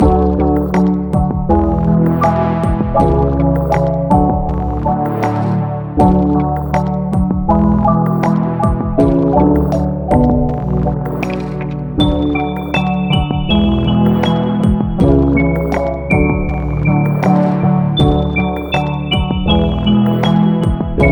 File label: stem bridge; Pix the Cat